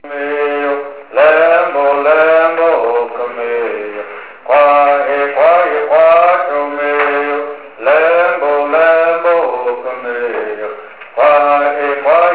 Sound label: Bird